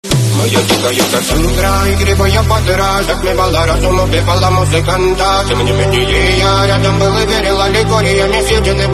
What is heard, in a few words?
remix